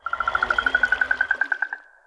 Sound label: Jungle Restore Up; Win98sounds